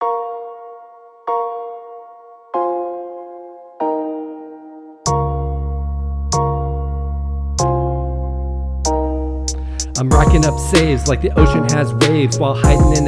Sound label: rap; dead island 2